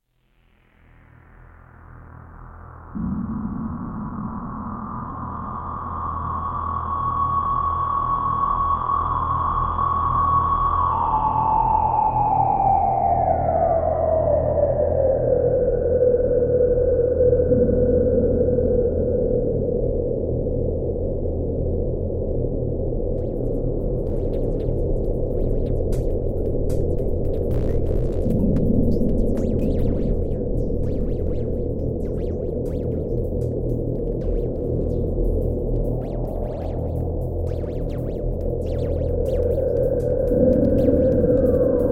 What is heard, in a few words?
thunder; megatone